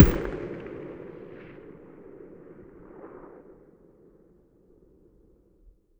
mg muzzle mono very far, weapons stationar

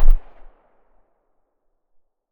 silencer far, weapons; vpo101

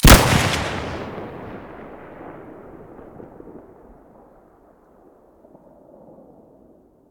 bm16 hole stereo, weapons

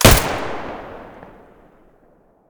muzzle p99 mono, weapons

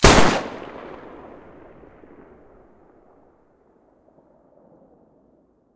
muzzle raging mono, weapons taurus

